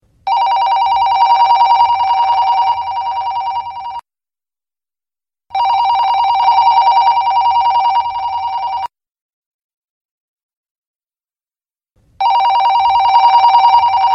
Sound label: business cell phone ringtone